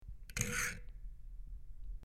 Dial Switch Metal

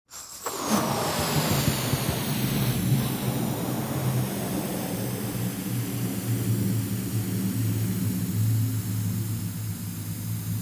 Medium Rubber Balloon; balloons

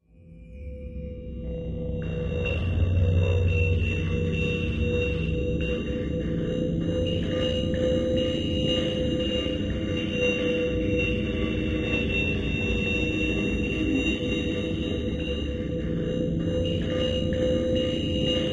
Particle Void Dist Meta, metallic

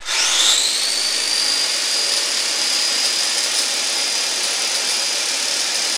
Saw Elect Turn On Run, saws

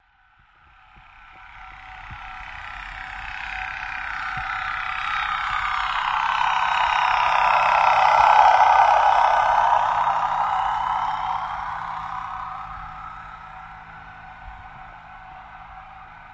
Torpedo Jet By, torpedos

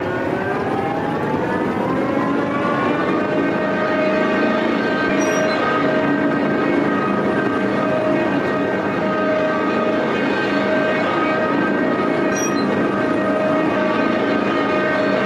avenger turret, missiles

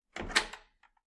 stall door latch; latches & bolts

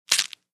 Candy Bar Shrt Bite

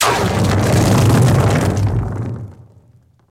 Earthquake, earthquakes

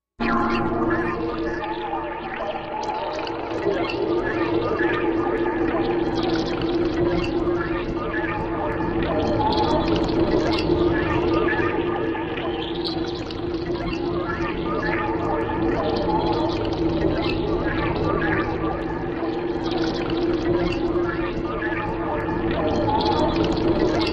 Swamp Baby Swamp Wet Dam, alien swamp

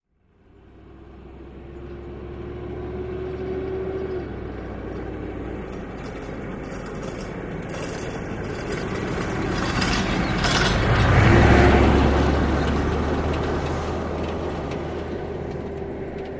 Humvee Med By Rattles

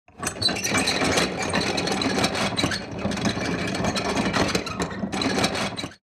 Gate Close Warehous, metal gate

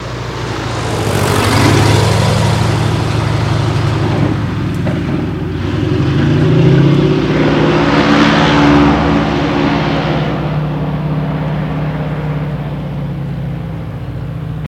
DHC Land By Rev

